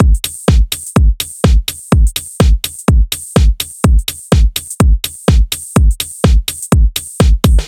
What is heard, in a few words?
Fridge B, minimal